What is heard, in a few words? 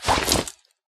cloth pickup; Items handling